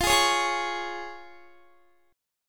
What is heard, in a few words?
F Minor Sharp 5th F down Guitar; strummed; tuning 4E,4A,5D,5G,5C,6F